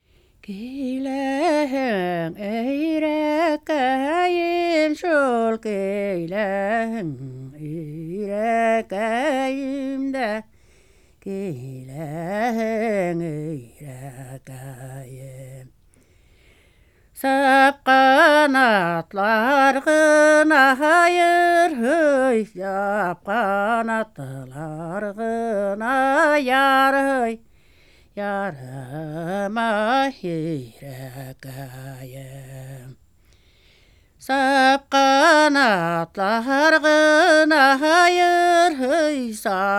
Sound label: tatar song normal singing